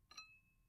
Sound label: Spoon Saucer; Eating; HauntedBloodlines; EatingCinematic; SFX Producer